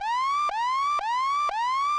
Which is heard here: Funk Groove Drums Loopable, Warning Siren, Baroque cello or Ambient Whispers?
Warning Siren